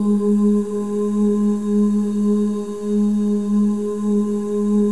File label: M+F OO G#3; Spectrasonics; Symphony of Voices; M+F OOS